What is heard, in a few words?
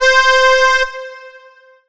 Yamaha FS1r Accordion C5